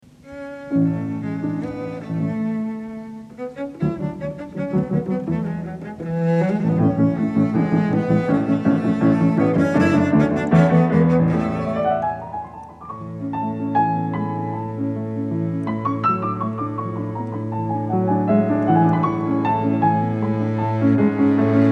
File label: Cello Sonata in A Major Op. 69 III. adagio cantabile Allegro vivace; Beethoven